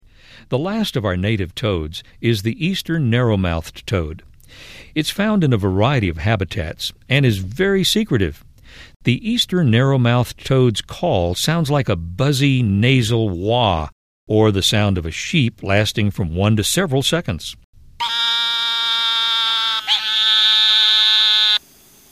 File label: eastern narrow mouthed toad